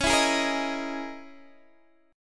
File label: C sharp Minor 6th Add 9th C sharp, Guitar DADGAD